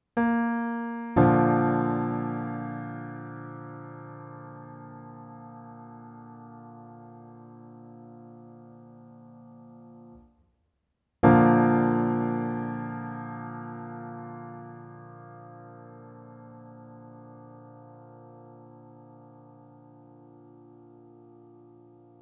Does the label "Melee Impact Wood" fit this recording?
No